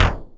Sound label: Yamaha RM 50 Toms